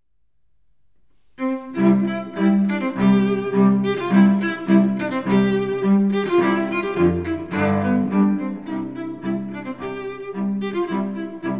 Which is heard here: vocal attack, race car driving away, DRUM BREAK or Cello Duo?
Cello Duo